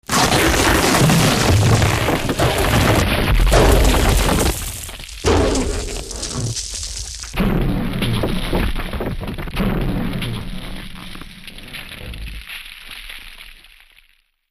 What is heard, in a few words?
Ripping Landslide, earthquakes